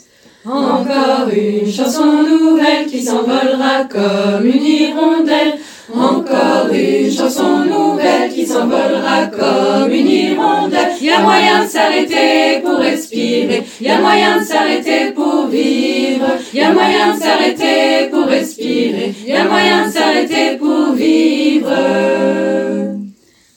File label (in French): tutti